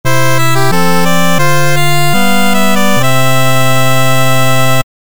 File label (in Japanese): 教会 祈る レトロ モノラル